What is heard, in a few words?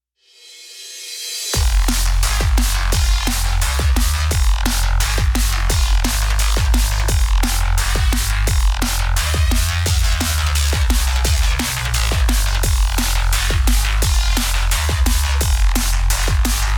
Heavy Neuro; Cyber Hook